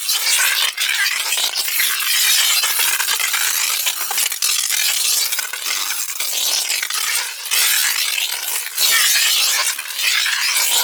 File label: Lightning Stomp Ground Electricity; Warframe; The New War; Archon; Boreal; Abilities